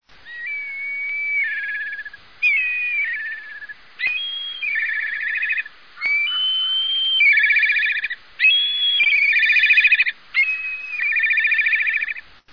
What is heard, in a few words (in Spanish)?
canto Milano Negro